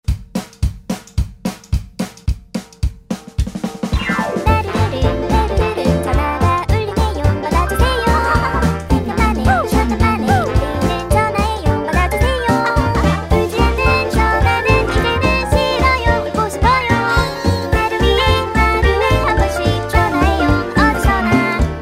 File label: Buzzbuzz; Samsung Galaxy S Hoppin; ringtones